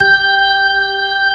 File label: B3 ORGAN, Dance Organs; Techno Trance